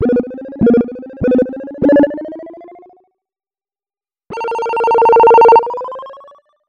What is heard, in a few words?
tones; SWATCH